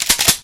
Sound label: shotgun pump; dn3d r